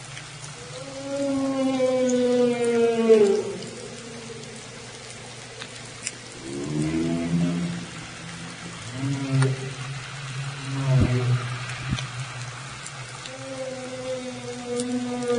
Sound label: humpback; niewert